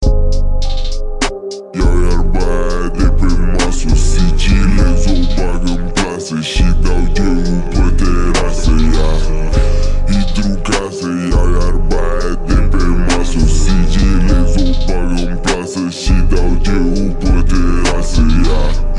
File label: Romanian Trap